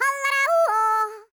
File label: YODEL, World Voices